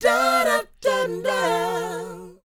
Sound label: DOWOP C 4; ILIO; Vocal Planet